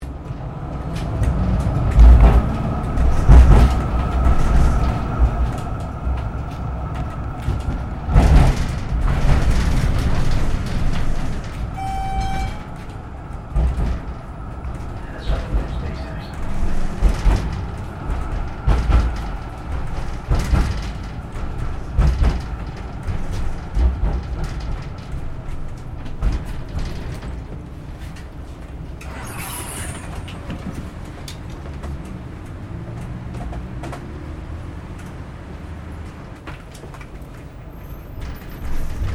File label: Tram Melbourne B1 Class Interior; Various Melbourne trams; Streetcars (Sound Effects:Vehicles)